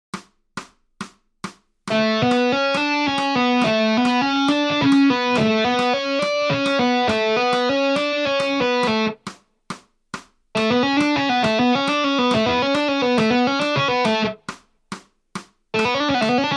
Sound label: Lick